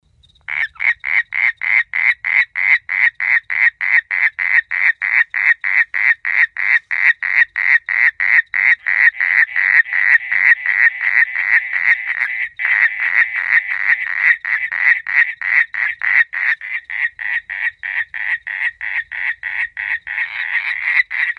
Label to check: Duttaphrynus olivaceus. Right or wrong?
Right